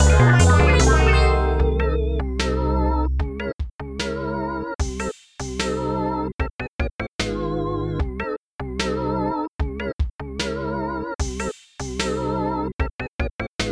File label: Game Over - Fanfare